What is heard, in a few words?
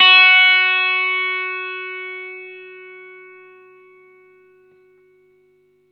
NOTE FS, Vintage Blues Guitar; AKAI; USB Soundscan vol.22